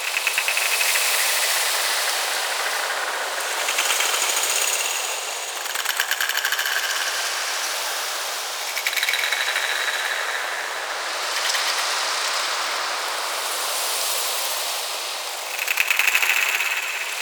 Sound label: Glowing Tree Loop Large; Ambience; Props; Orokin Moon